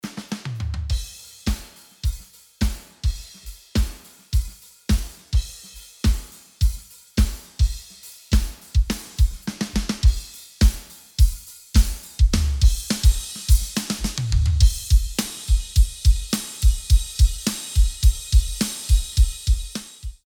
Drums pultec tube; gradboost

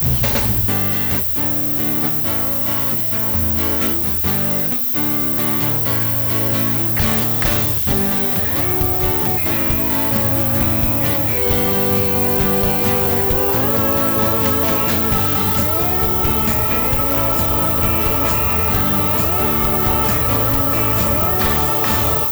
Pow-r 2, dithering

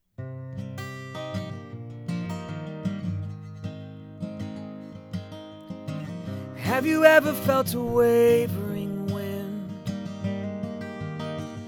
Music Blue Yeti Fig8; usb mic

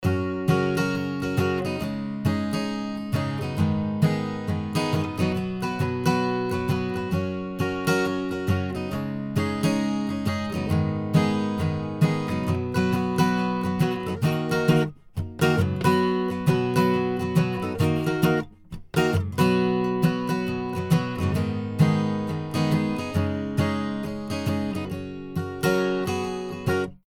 AcGtr; Manley mic; manley mic comparison gold silver refc

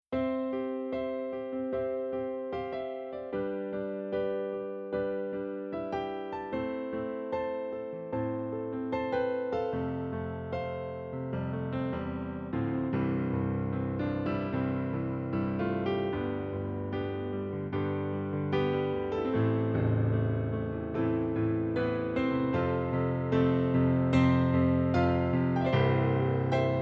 Stage Grand, digital pianos

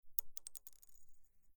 Pin drop plastic, acoustic guitar

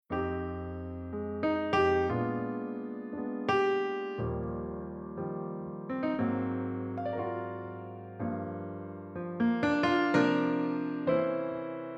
Jazz, piano; Waves Grand Rhapsody; virtual piano

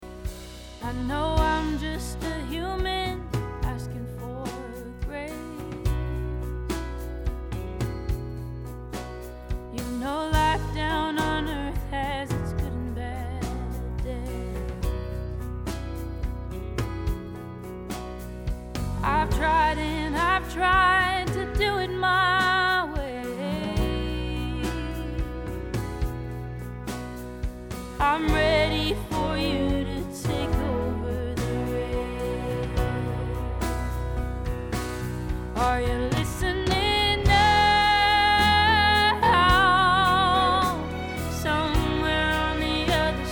Track Neumann U67

